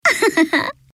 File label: laugh; yamabuki suou